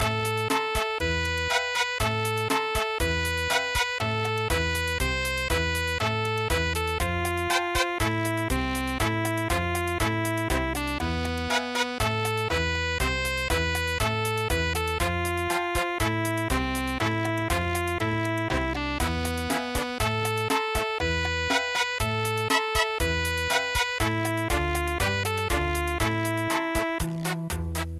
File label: cha cha cha; Sakura